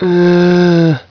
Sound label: zombie attack; svencoop; hunger; hungerzombiefem